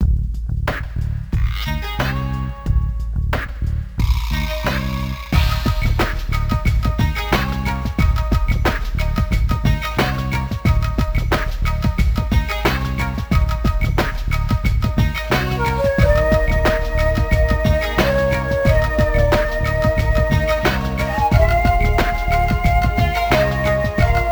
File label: Unused BGM; Ganryu; Neo Geo